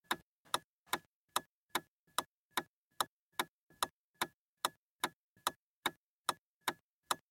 zvuki povorotnikov u avtomobilia

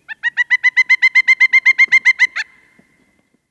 zvuki khokhlatoi zhelny

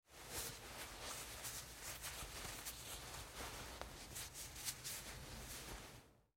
zvuki vafelnogo polotentsa

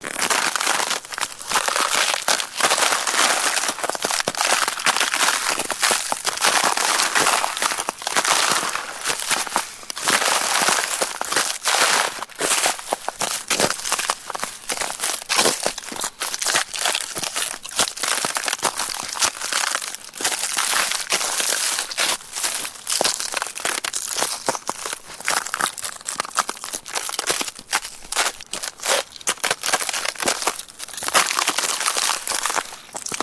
zvuki shagov po ldu

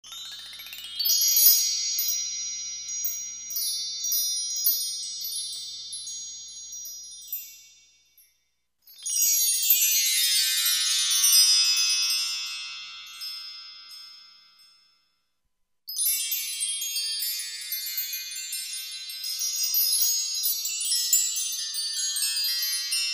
zvuki elektricheskoi girliandy

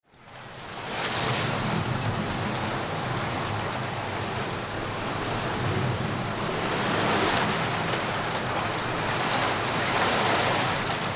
priiatnye zvuki dozhdia

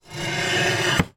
zvuki zerkala

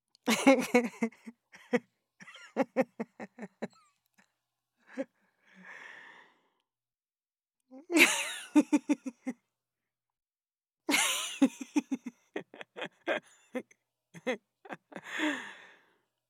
zvuki zhenskogo smekha

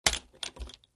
zvuki igry v shashki

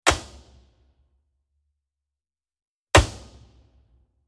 zvuki metallicheskogo trosa (kabelia)

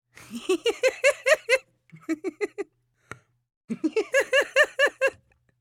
zvuki zhenskogo smekha

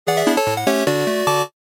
zvuki s effektom zaversheniia, okonchaniia

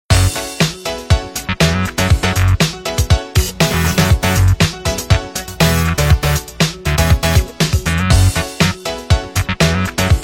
Nokia 808 originalnye ringtony i uvedomleniia

